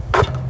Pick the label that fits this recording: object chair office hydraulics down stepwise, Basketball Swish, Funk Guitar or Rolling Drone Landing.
Basketball Swish